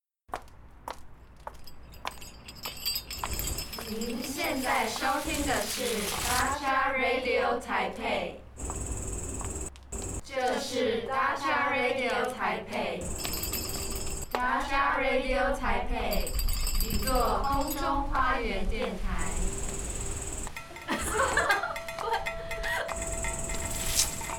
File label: jingle